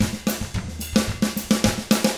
110 SMBA samba; ILIO; Double Platinum Drums 1

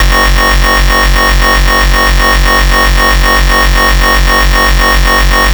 FS (F#1), Synth; neuro; Stanza; FightSynth Multi

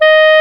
SAX D#4

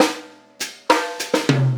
Trap Kit, Drums

